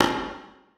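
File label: conga slap; Percussion; Sonatina Symphonic Orchestra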